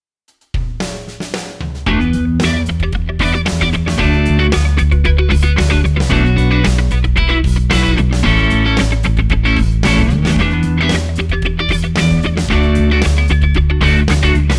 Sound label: backing track funk